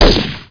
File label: M16 crack; SPECOPS